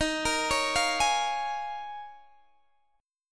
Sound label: D sharp 7th Suspended 2nd Suspended 4th, Guitar, Open G